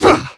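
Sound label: Templar Attack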